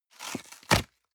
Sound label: box small tear apart hard; industrie wirtschaft; bueroarbeit